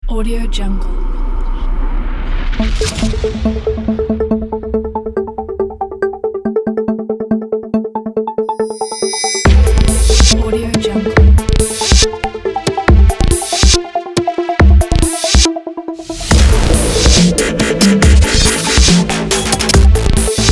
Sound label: hi tech; wowmusic